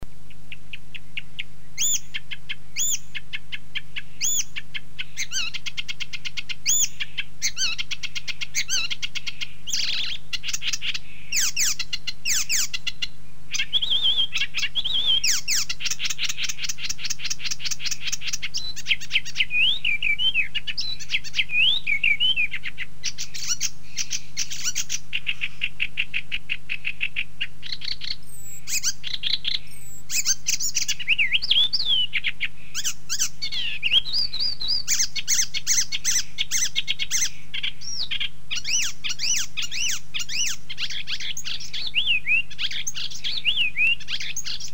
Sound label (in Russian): zelenaya peresmeshka golos